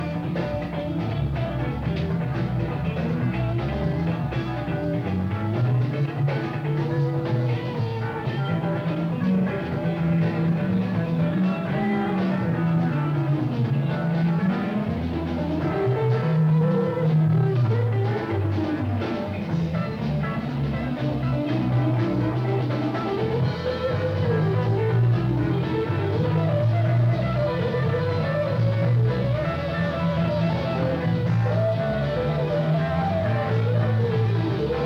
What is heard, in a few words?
Alumni Blues; Johnson, VT; Johnson State College